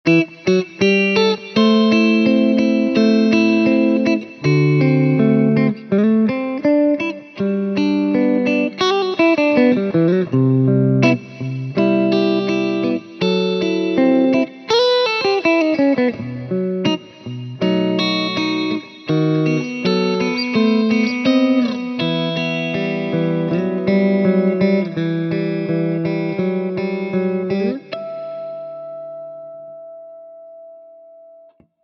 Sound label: middle mix